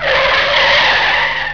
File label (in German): brems; bustwave